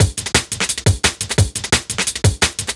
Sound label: DNB BREAK